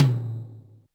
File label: Yamaha RM 50 Toms